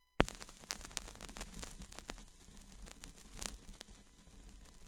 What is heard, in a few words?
vinyl record static only